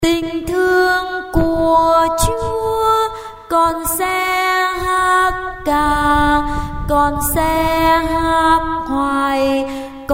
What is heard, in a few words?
basso tenor